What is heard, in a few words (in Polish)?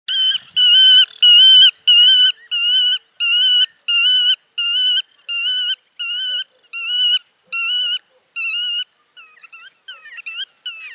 rycyk